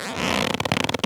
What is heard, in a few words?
Leather